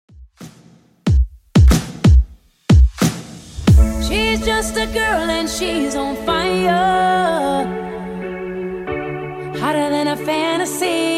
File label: 2ks Redrum 98bpm